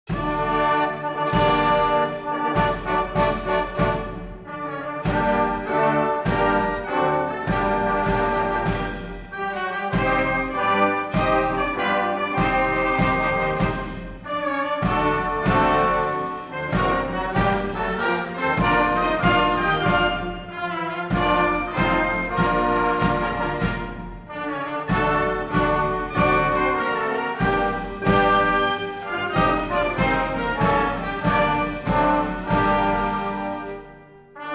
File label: instrumental; istiklal marsi